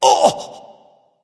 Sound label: surprise; cuchulainn